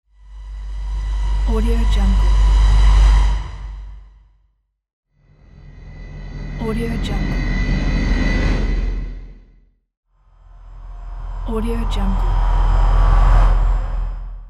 haunting horror swells

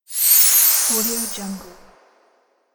shining whoosh; nektar pro

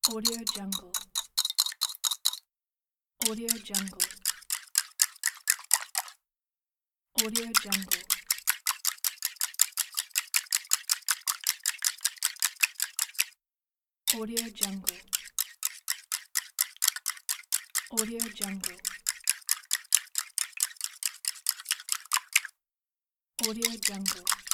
egg mixing; fx-market

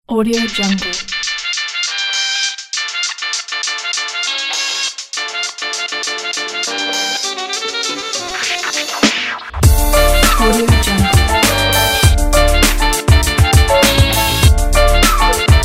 latin hiphop

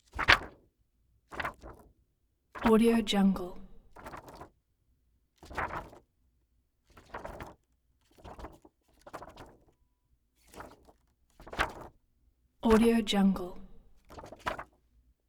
photograph paper

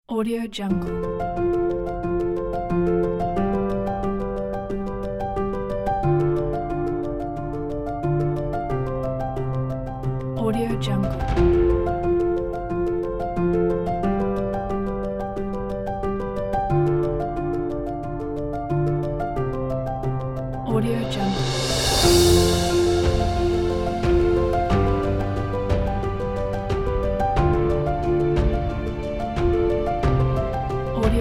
emotional inspiring piano

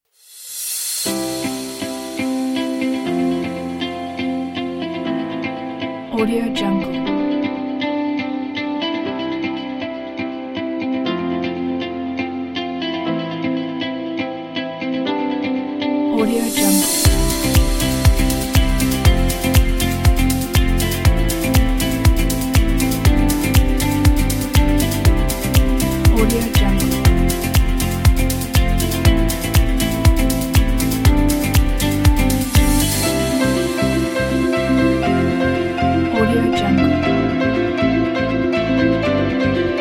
upbeat motivational acoustic

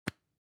object hygiene lipstick open soft

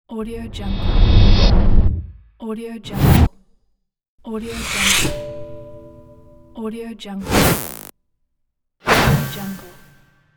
swoosh rebound

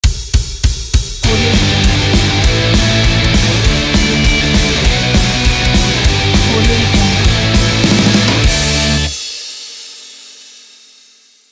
indie punk rock logo

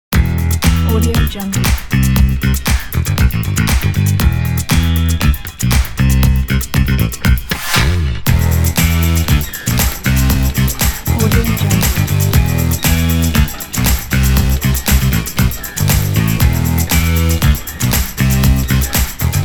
summer funk party; noizzybeeproduction

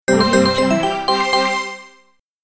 game success notification

